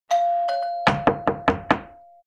zvonok i stuk v dver

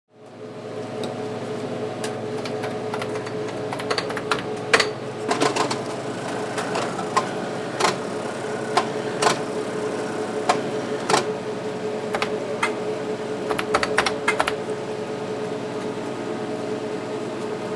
shum priborov

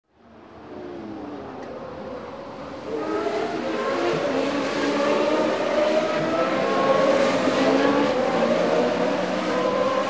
zvuk bolidov formula 1

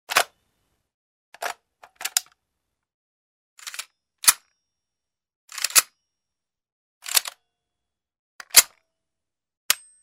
zarjadka pulemeta

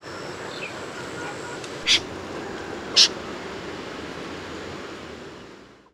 aziatskij bekas

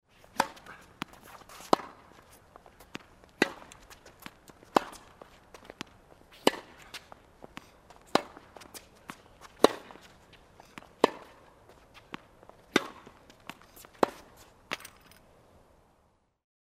zvuk igry v tennis

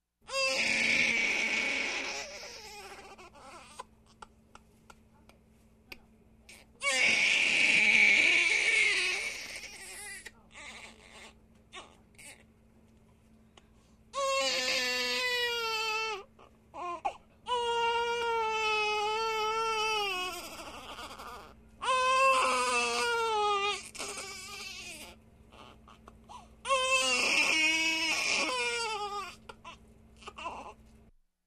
plach grudnogo rebenka

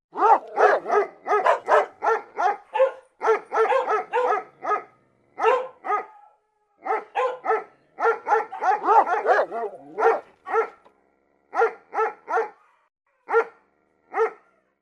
Sobaki - Lay sobak

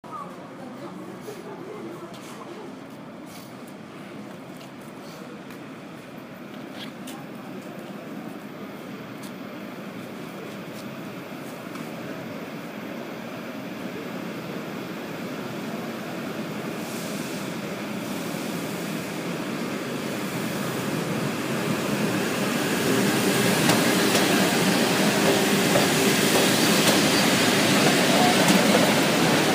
shum ljudej v elektrichke

